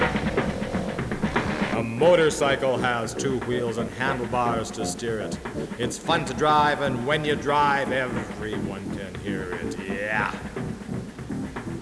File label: motor